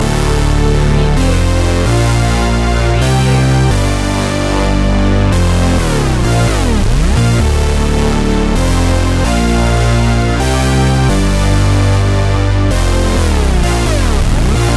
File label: block party loop